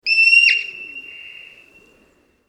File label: olympic marmot; ringtone